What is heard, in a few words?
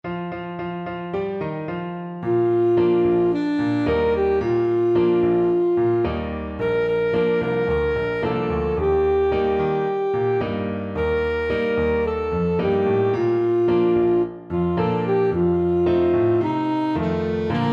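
alto saxophone; famba naye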